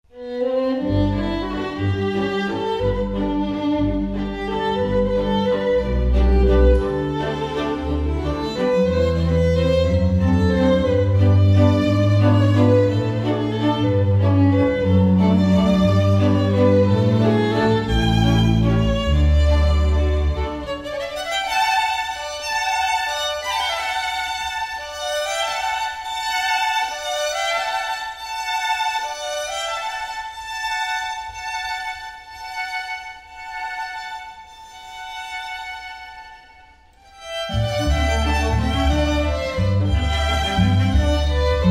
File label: Waltz